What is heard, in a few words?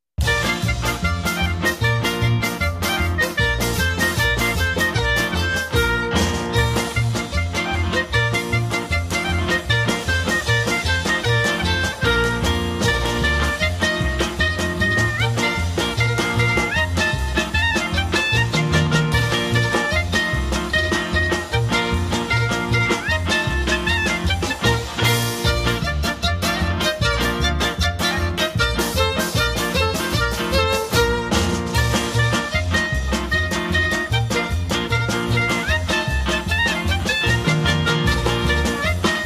Passover Song KARAOKE; DAYENU